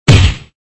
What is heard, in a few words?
High Impact